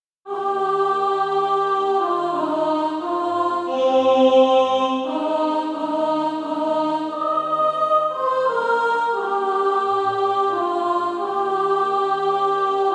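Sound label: soprano, SATB Practise Music; Deep River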